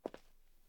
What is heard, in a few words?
footstep; gmtk24